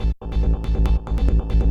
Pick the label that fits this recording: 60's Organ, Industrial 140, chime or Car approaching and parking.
Industrial 140